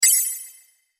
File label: chime